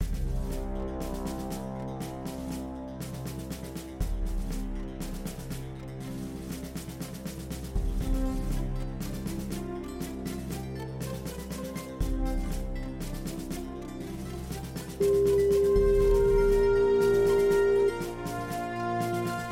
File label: Fanfare; First Fanfare